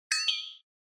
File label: Percussive Accent Dry; 打楽器アクセント; ドライ